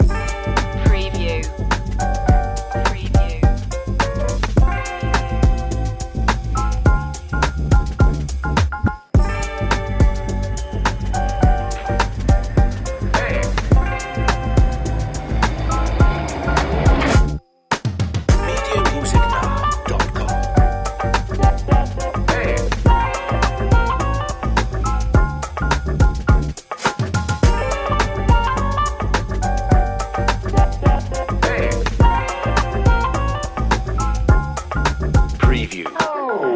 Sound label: comical detective loop